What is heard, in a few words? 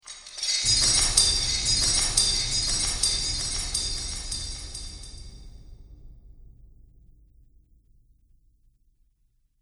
Metal Chains Magic Ambience